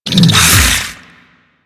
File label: Infested Big Ashen Melee Swing; Warframe